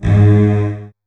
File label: DOUBLE BASS; ILIO; Kirk Hunter Virtuoso Strings VOL-2; 90 s Sample CDs; 5DOUBLEBASS